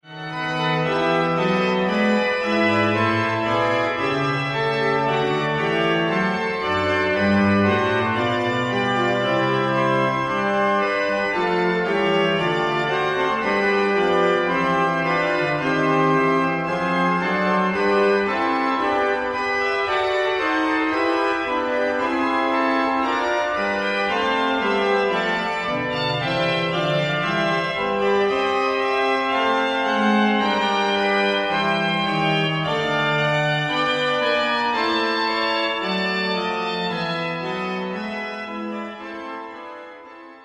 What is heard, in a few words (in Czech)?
Fuga G dur; J. K. Vanhal